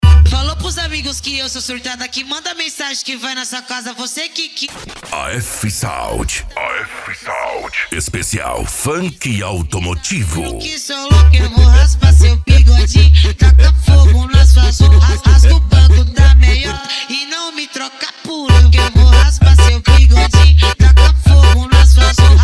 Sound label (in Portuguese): esp funk automotivo; afsound